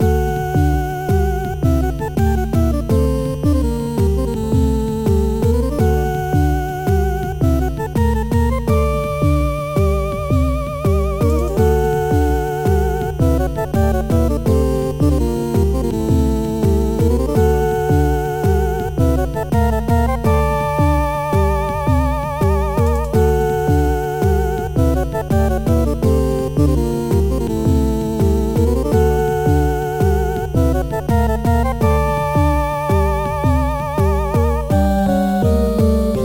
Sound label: 8bit bgm